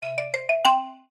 happy ringtone, nokia sms ringtones

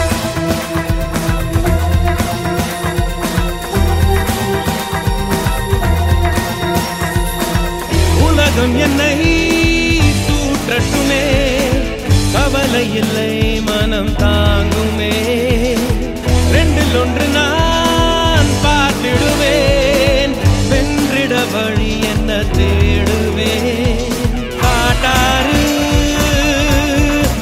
tamil music ringtone

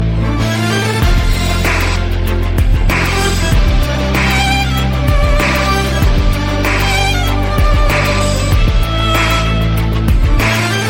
hip hop tone ringtone

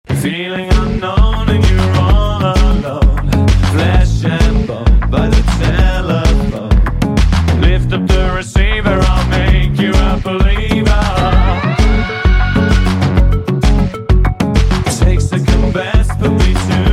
minimal jesus ringtone

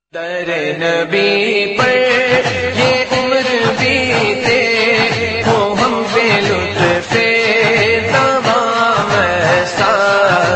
naat

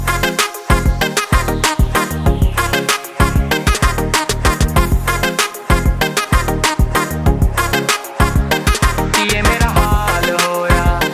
dj hindi music ringtone, bollywood ringtones

